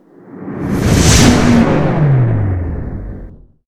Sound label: Cardassian Exit Warp; NanoFXv2; WarpFX